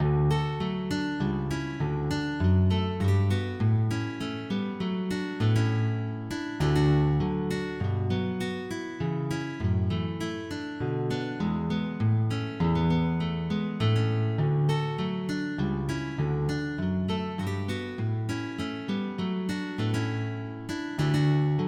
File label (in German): Double D dur